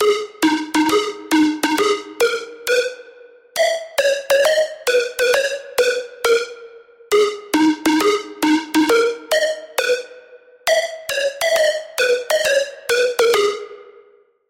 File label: Pluck, Sylenth1; Routed Sound; Sylenth1 Expansion - Star Scavenger Vol.1